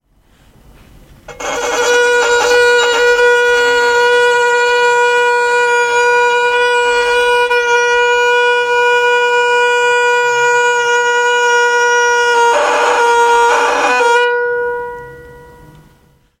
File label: violin